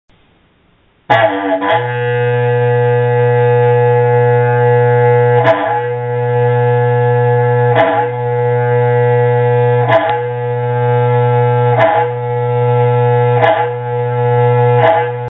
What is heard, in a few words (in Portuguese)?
toque para celular berrante